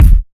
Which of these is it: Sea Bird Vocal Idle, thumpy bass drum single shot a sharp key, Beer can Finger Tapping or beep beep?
thumpy bass drum single shot a sharp key